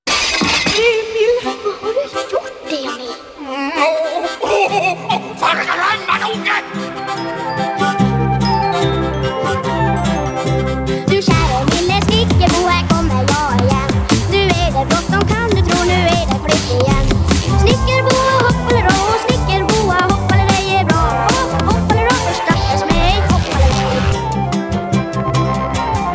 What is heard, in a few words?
2step DnB; Emil i Lonneberga